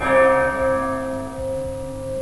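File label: long bell, sirens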